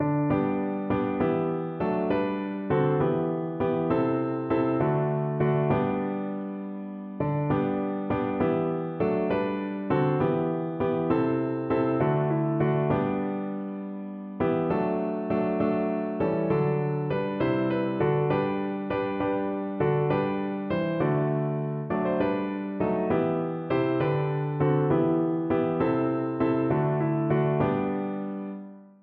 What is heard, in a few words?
seven joys, piano